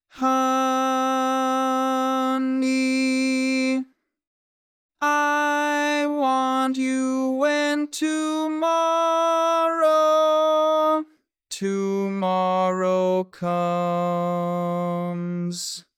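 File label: Baritone, barbershop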